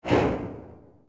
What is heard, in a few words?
thwok, train; Z8100; OpenBVE